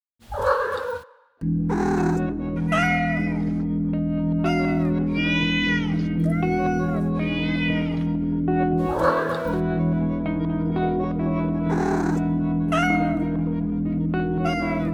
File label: calming cat music